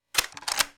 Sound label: Bolt Rack; Firearms; Zomboid